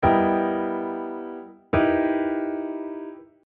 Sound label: polychords - separated and mixed